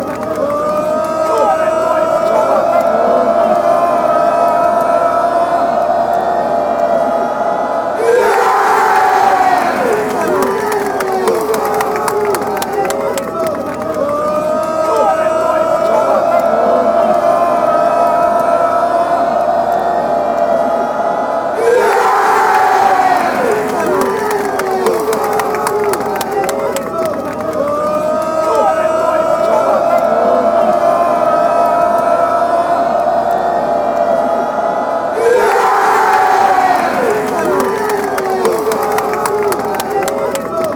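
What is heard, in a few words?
fanchants, football chants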